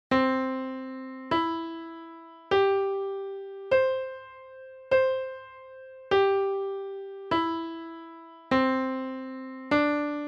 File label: Chord Sample